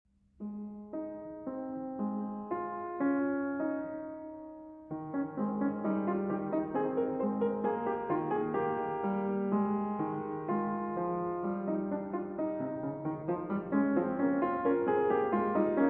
Prelude & Fugue in A-Flat Major, BWV 862 II. Fugue